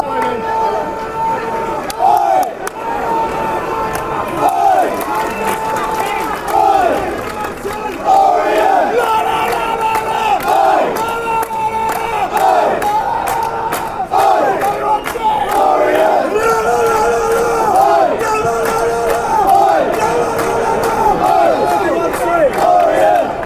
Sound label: oh theres only 1 team, fanchants